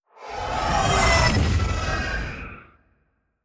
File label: Necro Resuc Spell